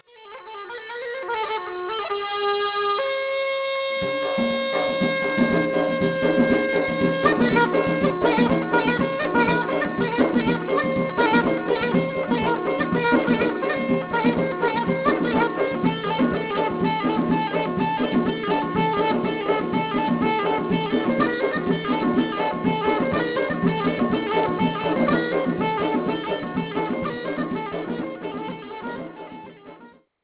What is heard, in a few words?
bandari